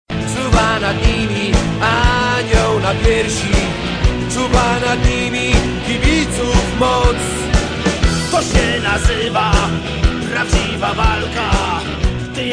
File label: hymn